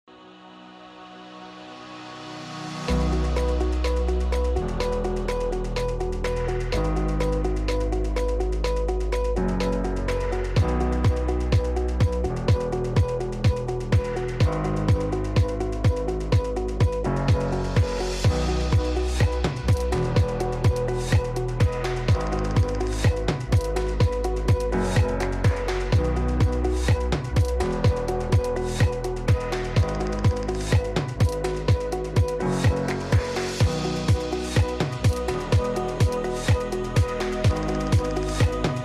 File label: Upbeat Corporate